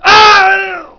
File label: ahgieie; Army Men; GreenUnits